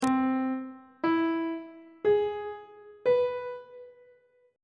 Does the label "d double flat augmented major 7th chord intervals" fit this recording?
Yes